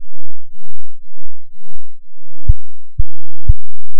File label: wavetable